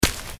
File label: land